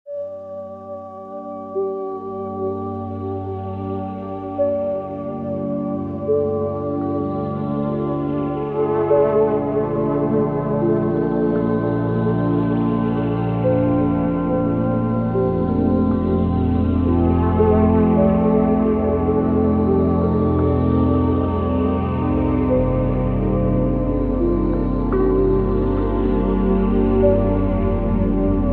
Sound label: Molecular Ambience